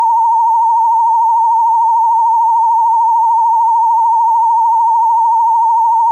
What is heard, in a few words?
A147 modulating A111 freq; CGS; Noise Sweep